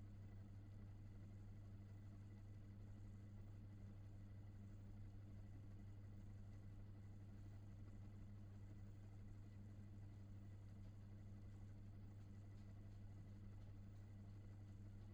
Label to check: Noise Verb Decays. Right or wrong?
Wrong